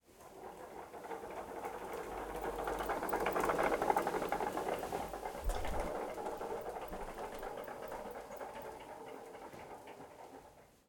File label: retro vacuum cleaner exterior wheels moving slowly on carpet left to right; ortf; Sonniss GDC